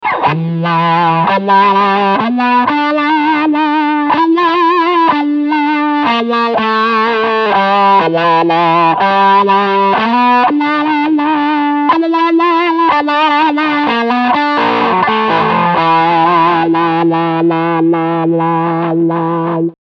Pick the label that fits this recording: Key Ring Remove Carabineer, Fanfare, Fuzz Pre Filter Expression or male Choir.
Fuzz Pre Filter Expression